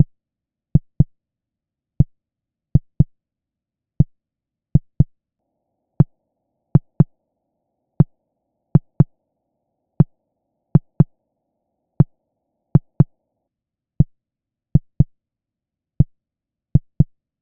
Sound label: Moogerfooger Drum 2Pol 4Pol; Amazona Moogerfooger Workshop